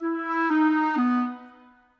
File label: Clarinets, Orchestral; Sonic Foundry (Sony Creative Software); Orchestral VOL-2; Modern 11